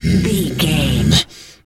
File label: wolf growl